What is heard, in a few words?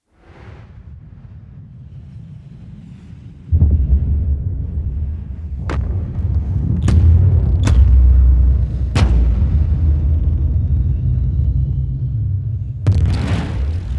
rumble